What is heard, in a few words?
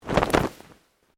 Clothing Shirt Cotton Flap Sharp; Movemement sounds for various articles of clothing; Dressing